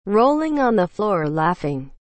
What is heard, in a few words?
rolling on the floor laughing